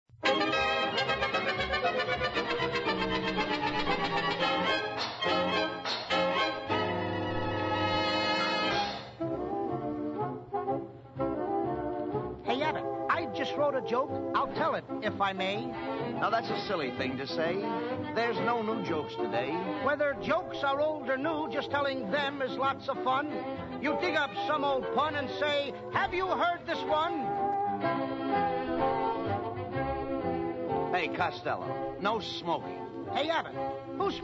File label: Laugh Laugh Laugh